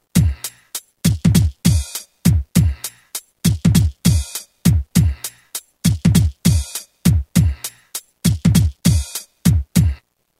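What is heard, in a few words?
breakdown 100; Roland M-DC1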